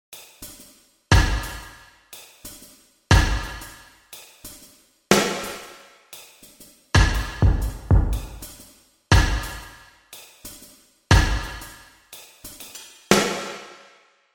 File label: MIDDLE LARGE PLATE